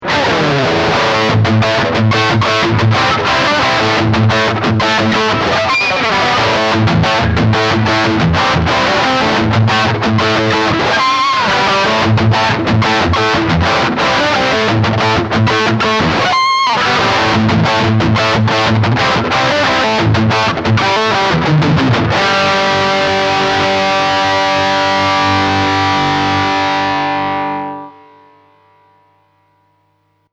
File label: Lead